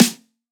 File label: Dubstep Snare; Ghosthack; Dubstep Sound Arsenal